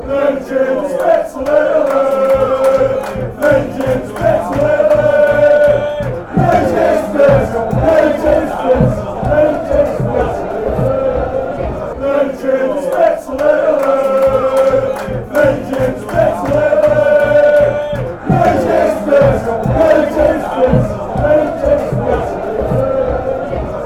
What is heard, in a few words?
chant, fanchants; excelsior